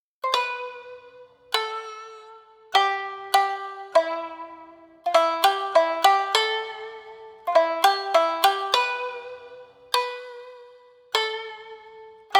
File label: shamisen sample; issunboshi